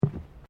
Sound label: Footsteps Boots Bridge Wooden Step; Footstep Foley; Human